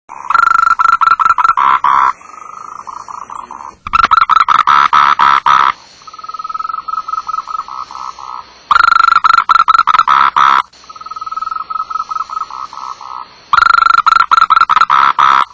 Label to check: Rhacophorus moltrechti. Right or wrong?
Right